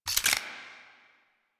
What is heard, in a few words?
Refill Clip; Warframe; Duviri; Boon Powers